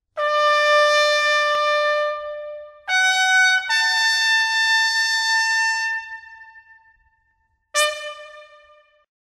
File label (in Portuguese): toques